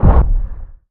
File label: reel Bounce; oceans treasure